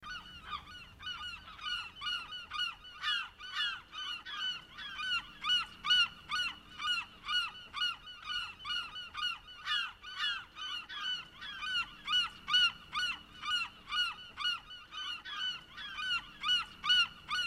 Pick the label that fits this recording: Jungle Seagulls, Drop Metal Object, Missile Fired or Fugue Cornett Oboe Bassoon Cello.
Jungle Seagulls